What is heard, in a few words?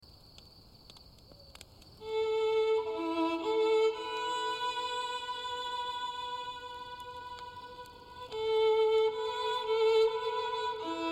Violin